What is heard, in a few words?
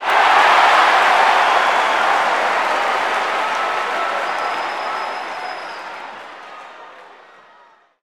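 Basketball Crowd Large Cheer; SFX Kit; Crowds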